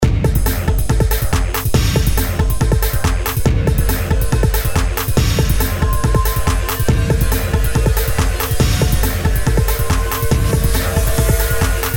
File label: elec vibes